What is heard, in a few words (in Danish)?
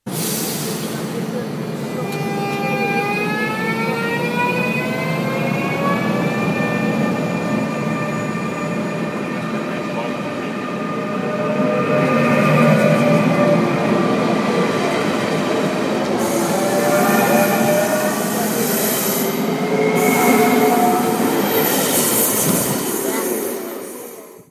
ir4 drift, tog